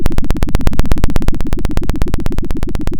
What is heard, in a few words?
pop, Sound of a helicopter